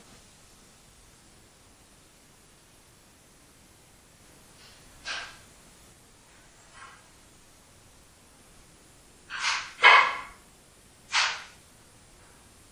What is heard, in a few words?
Door latches